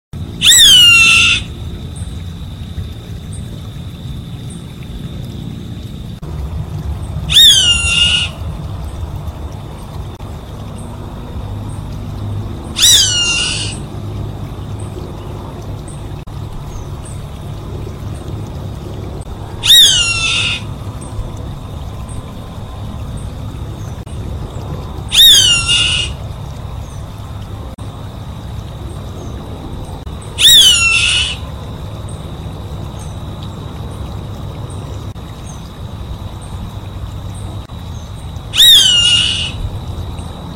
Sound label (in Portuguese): Canto do gaviao pernilongo